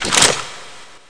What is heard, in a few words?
gun pickup